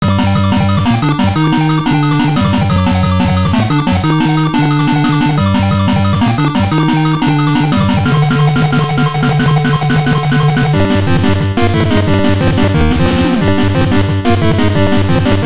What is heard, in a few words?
Boss Fight; Gremlins 2 The New Batch; gameboy